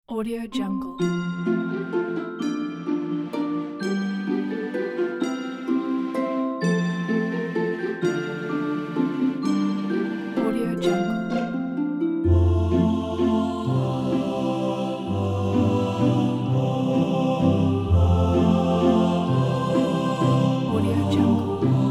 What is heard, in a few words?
we wish you a merry christmas